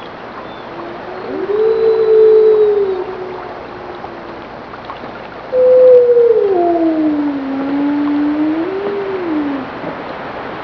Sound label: wolf howl water